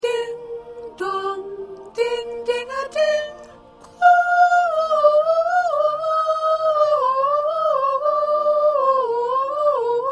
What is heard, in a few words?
Soprano; christmas songs for choir; PRACTICE TRACKS; Soprano part preview